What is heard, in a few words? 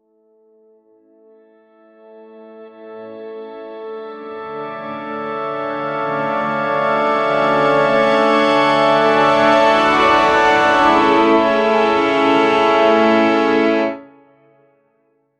orchestra transition